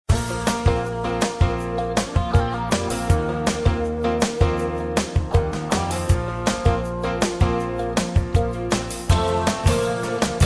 country

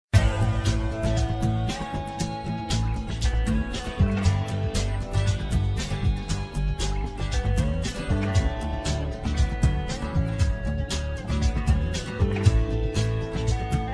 pop rock

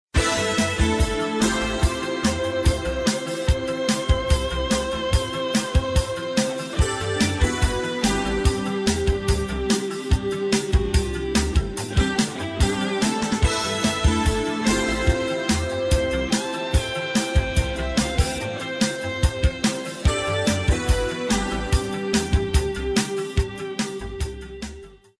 rock heavy

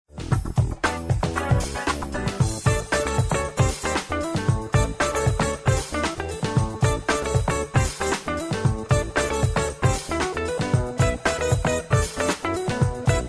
jazz funk

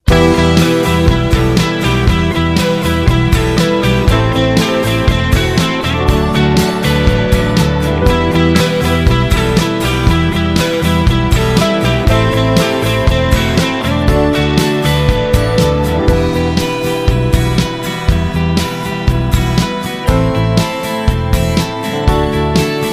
Pop Rock

